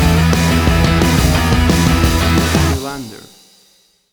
Punk Rock Stinger

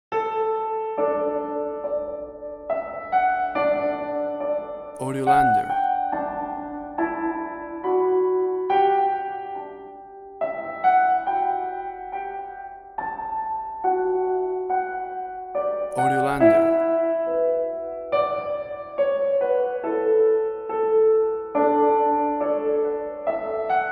Away In A Manger Smooth Piano

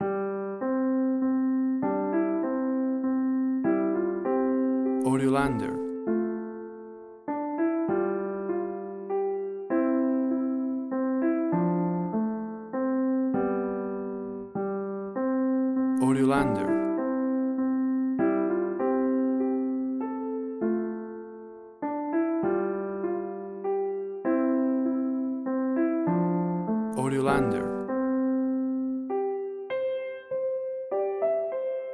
Away In A Manger Piano Version

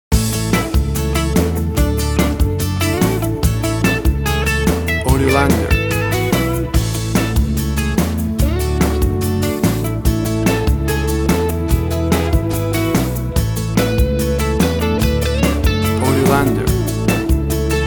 I LOVE YOU WITHOUT VOCALS

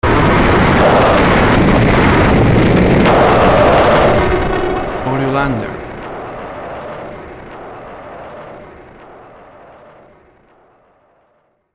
The Sound Of Pain

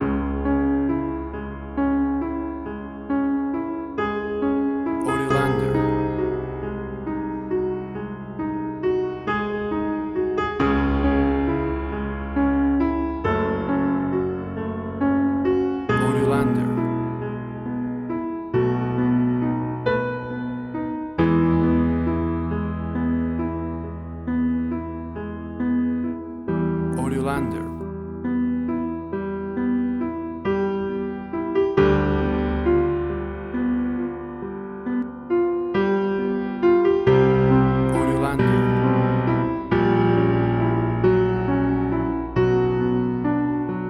Beethoven Moonlight Sonata

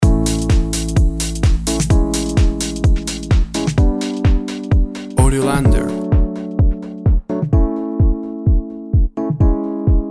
Tight Funky House Music Groove

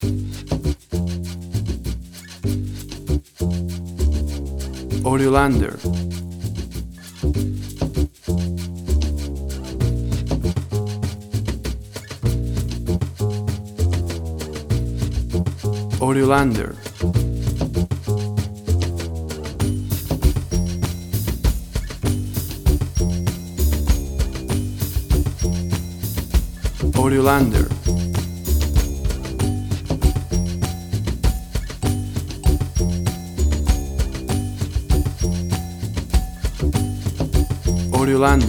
le cumbia

